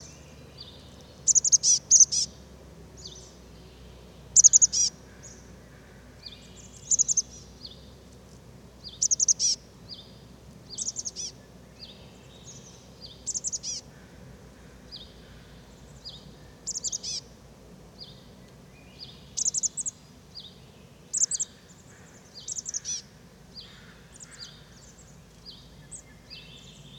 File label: Chestnut backed Chickadee Poecile rufescens; birds-that-eat-suet